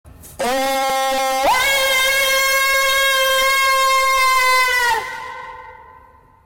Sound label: cry; farestar